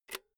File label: insert jack cable quick